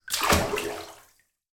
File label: household bath hands splash water